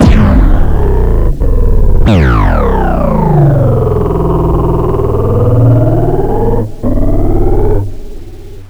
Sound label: fire; cstrike; wpnmod; dis fire